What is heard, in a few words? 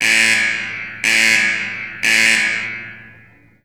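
airport security gate buzzer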